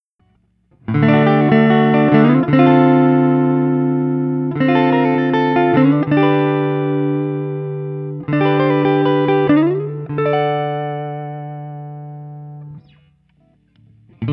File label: Guild NS X350 Stratford ODS100 D